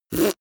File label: beatbox effect suck in